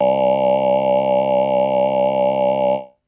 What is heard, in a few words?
synvox C1, Synthesized Male Voice